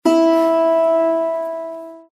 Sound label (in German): Zithermacher Ton 8 LAUTER